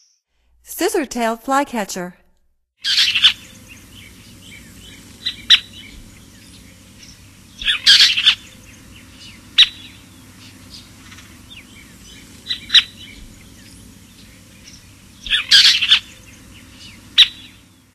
Scissor-tailed Flycatcher, Songs of Birds of North America; Stokes